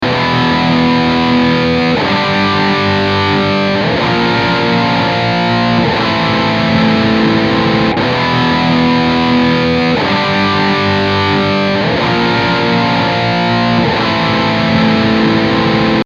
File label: BRIDGE drive, eclipse active, line6